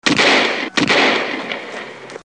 gunfire court

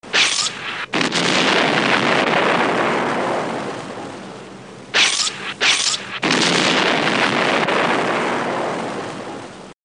ufo laser explosions

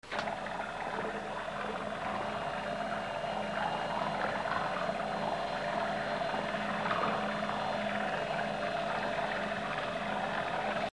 skydiver Exterior

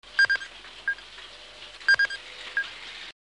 moon radar blips

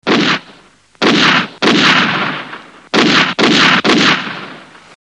gunshots

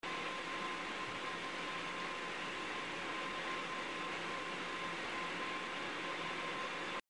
car Interior

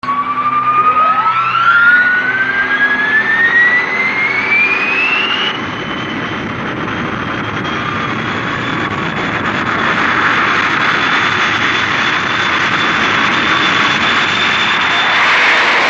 interceptor Launch

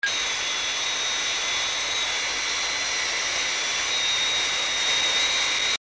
interceptor Interior

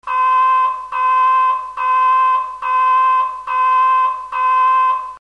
moon Alarm

